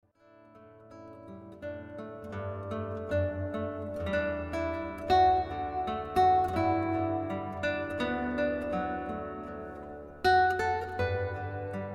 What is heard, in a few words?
Classical Guitarist; Lansing